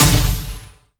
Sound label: hit